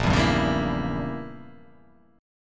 A sharp Diminished 7th, chord

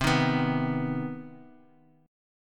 C Minor Major 7th C; strummed; JGuitar

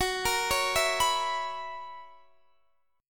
F sharp 11th F sharp down Guitar Open D

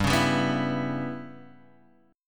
E Minor 6th G

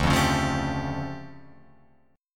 D Minor Major 11th down Guitar Open F; DmM11 strummed; JGuitar

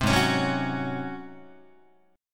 Ab 11th Ab Guitar; strummed; JGuitar

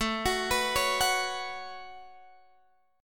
A 6th Add 9th, Guitar, Open G

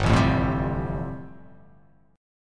G 7th Suspended 2nd Suspended 4th G

